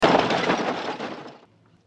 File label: wagon; Unciv